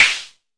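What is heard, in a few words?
mbrute snare, Arturia MiniBrute; DRUM MACHINES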